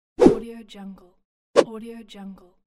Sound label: fast woosh